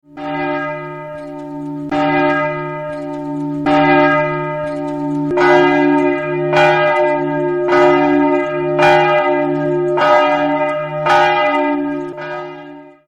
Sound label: Church Bells France mono